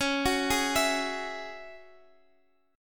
Ab 7th Suspended 4th Sharp 5th Db, Guitar

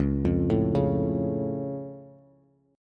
Db Augmented, down, Bass; arpeggiated; JGuitar

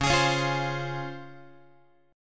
C sharp Major 7th, Guitar; C#M7 strummed; JGuitar

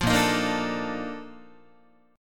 A sharp Major 11th Guitar, chord; A#M11; strummed; JGuitar

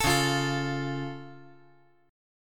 Db Augmented, down; strummed; JGuitar

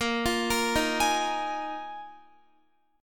Bb 7th Suspended 4th Bb Guitar 4E,4A,5D,5G,5C,6F

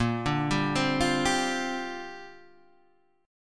A sharp 6th Add 9th A sharp, Guitar Open G; 8,7,5,5,6,5; down

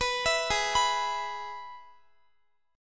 G sharp Minor Sharp 5th, Guitar; G#m#5 arpeggiated; x,x,21,21,20,18

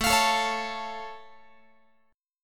A Augmented A down Guitar; A+ strummed; x,17,20,19,17,20

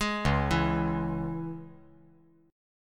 C sharp Minor C sharp, chord

